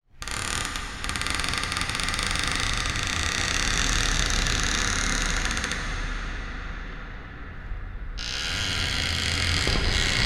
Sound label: horror door creaks low pitched multiple large reverb